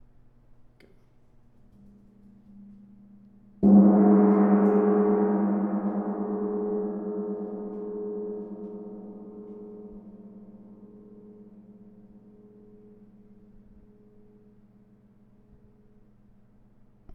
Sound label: gong